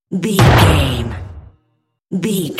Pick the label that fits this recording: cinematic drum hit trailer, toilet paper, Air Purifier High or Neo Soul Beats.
cinematic drum hit trailer